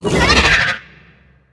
screech; gonarch

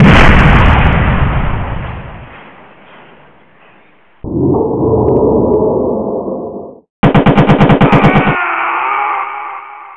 tyrant kills

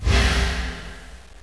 chem air

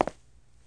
ninja step

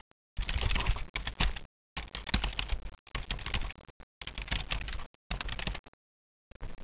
terminal use

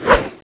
knife slash

